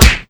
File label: slap hit; gesource; weapons; slappers